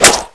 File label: sword flesh; GoldSrc